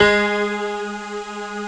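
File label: synth G#, STAB, House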